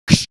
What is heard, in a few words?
Beatbox; AMG